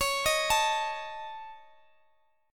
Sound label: C sharp Suspended 2nd Sharp 5th C sharp Guitar Open D, chord; x,x,x,19,18,19